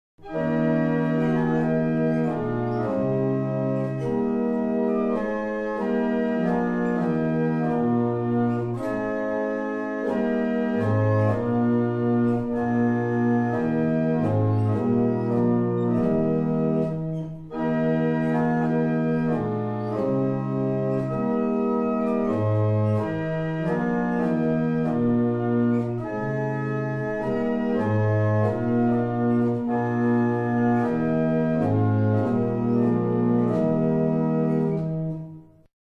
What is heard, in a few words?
Double chant, barrel organs; Bressingham